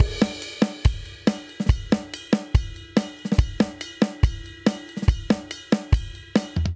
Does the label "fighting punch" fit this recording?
No